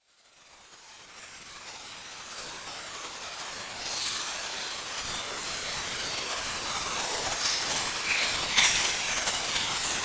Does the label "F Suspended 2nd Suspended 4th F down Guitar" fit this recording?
No